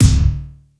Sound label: PLAST KICK, PLASTIC DRUM; Crypton - DrumBase5000